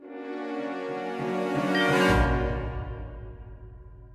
betray end; holy potatoes a spy story linux macos windows gamerip 2018

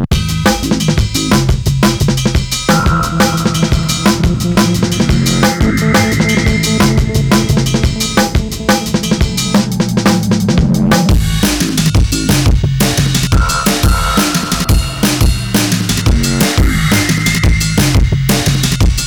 Drumfunk; beatmania 6thmix; the UK underground music

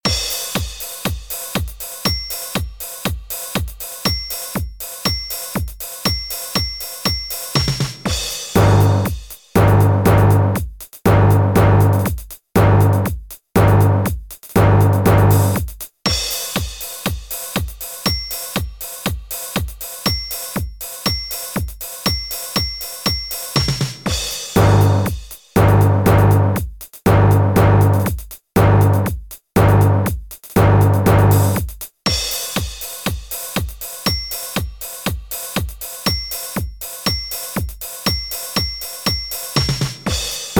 Rhythm Lesson - Timpani 2 (Single); Rhythm n Notes

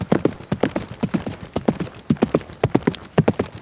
HOOVES

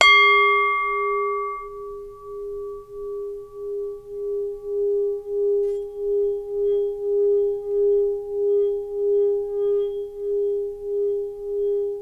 Bell Chime; Splatoon 3

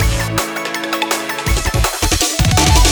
Transition; sky rogue

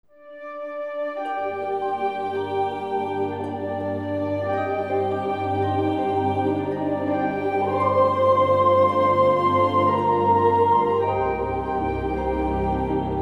bgm game over; The DioField Chronicle; PS4, PS5, Switch, Windows, Xbox One, Xbox Series XS

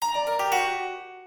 Music box

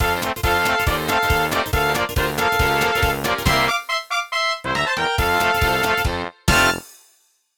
Level Clear!; BS Super Mario World; SNES

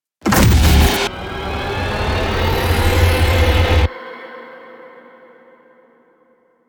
combat 140bpm; combat UAC 03 140bpm wip GLORY 03

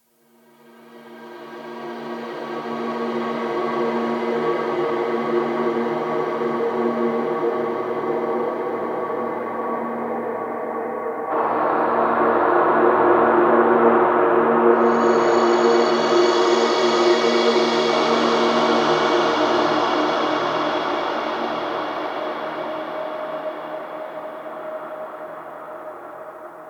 Wilderness Explore Intensity; Ferelden Wilderness Explore Intensity 03

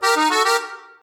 Organ Riff; Levels Hub Swamp 01 Music Ray

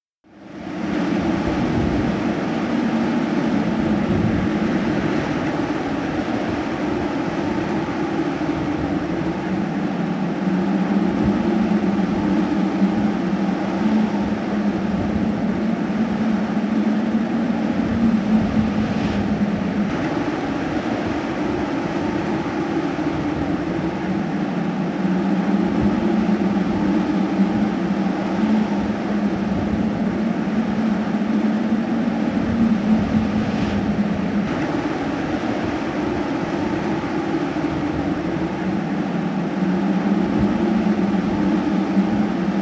Desert Stage (AMBIENT); King of Fighters XIII; PS3

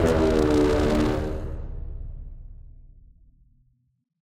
Puzzle Fail; Mirror Room Second; tintin reporter cigars of the pharaoh

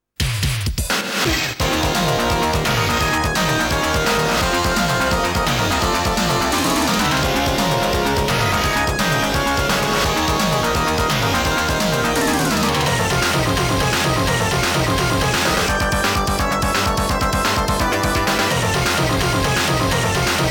Fighting Spirit

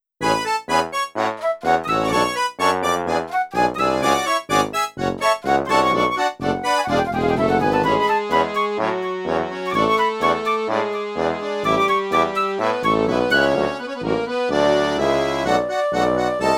Street Corner Live Happy Tango-ish; Tomodachi Collection: New Life Premium OST

